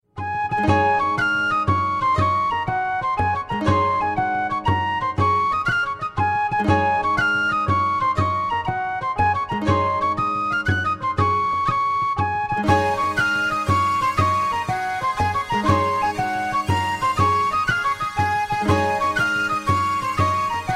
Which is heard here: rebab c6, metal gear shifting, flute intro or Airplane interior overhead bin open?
flute intro